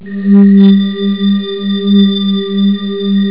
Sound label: newage; labrat; jazz jackrabbit 2